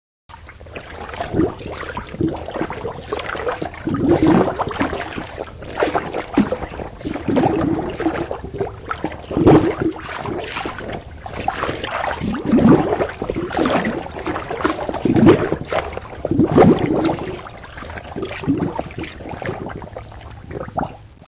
shark swin anger

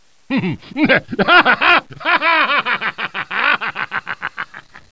mad laugh; hl2; monk

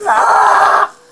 damage; cyrax; raxx

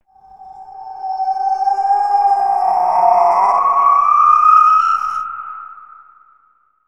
lift

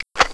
saw close

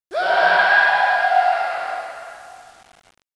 fallscream

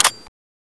plasma cell in

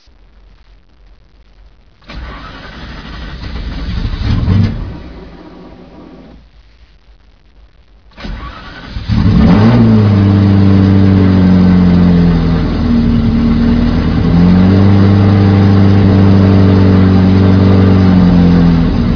f40 startup

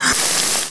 pl burn pain, player

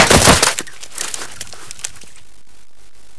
crawler bodyfall

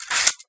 cock, xbow

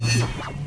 forcefield crash

